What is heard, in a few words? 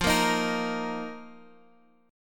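E flat Suspended 2nd, F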